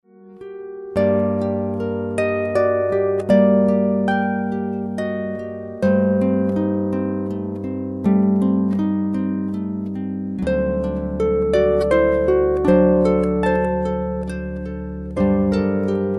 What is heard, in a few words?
Siciliano